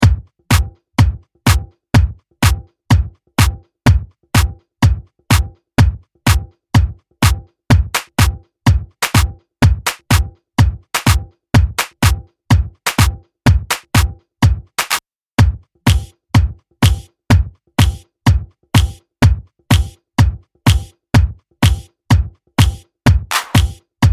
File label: Ultimate Club Claps